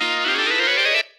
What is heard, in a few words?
growing, zaps and beeps